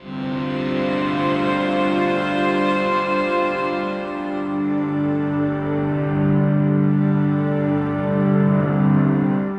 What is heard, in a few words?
Elektro Mass, Elektrik Drone, Sky Church; Sonic Foundry (Sony Creative Software) - Friendly Fire; DroneLoop